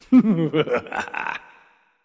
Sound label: laugh evil male